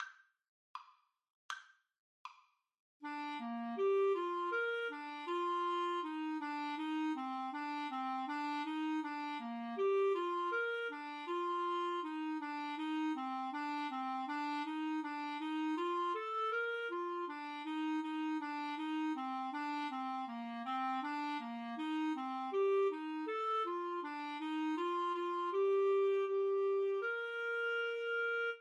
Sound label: Clarinet Duet